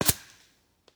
bullet by supersonic tail; Counter Strike Model Pack; CS2

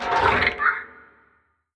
vent journey, headcrab classic; headcrab classic, scripted; creatures

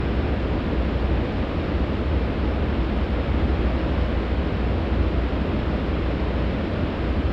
amb industrial; ambient; atmosphere; Counter Strike Model Pack; CS:GO

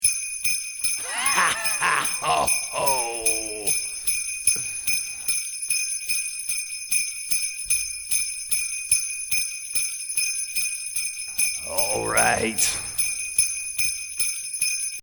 start round, music

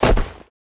land snow, footsteps

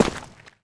dirt, footsteps

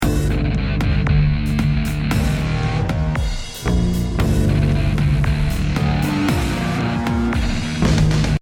start round, music

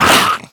attack grunt, headcrab, creatures; headcrab classic; vox

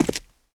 concrete, footsteps

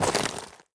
step light, footsteps player debris

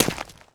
dirt, footsteps

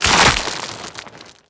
zombie move loud, foley; creatures; Half Life Model Pack

